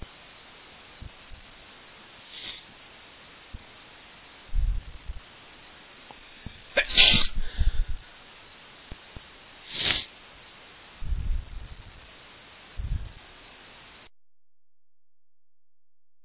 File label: natural, male